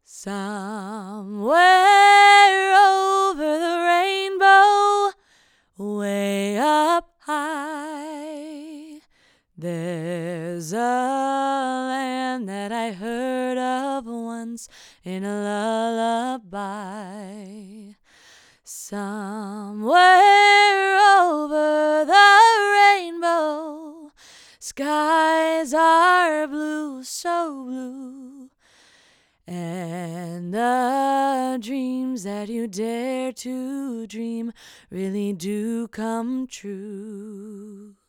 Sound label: FEMALE VOX; FET